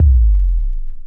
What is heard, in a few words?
SH101 Big Sub Dirty Vinyl Synths C3; vinyl perc